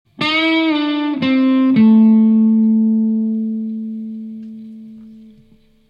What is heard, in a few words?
guitar minor pentatonic wail; online lessons